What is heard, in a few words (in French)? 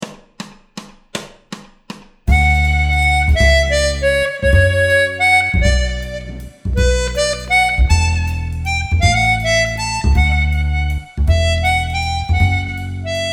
sans Piano ni Guitare